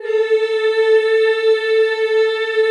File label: CHO A3, Eeh; Eeh + Ehh